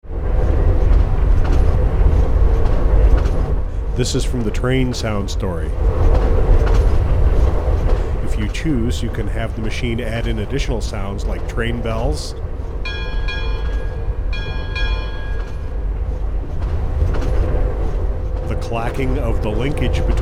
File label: train